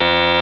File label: Buzzer; Mech Zone